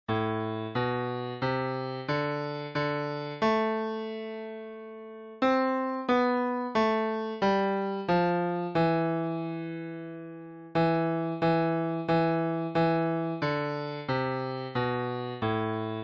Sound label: Bass